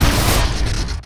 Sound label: war damage; NECROMETA; Mob